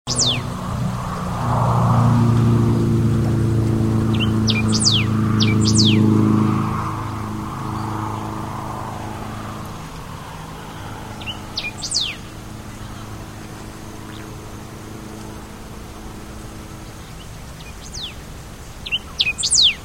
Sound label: collared warbling finch